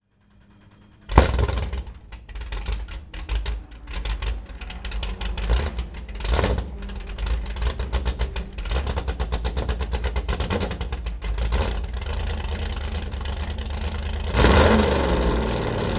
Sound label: 1968 McLaren M6B start